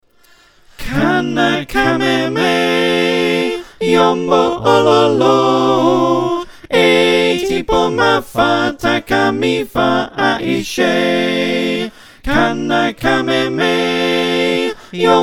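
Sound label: soprano alto tenor bass, choir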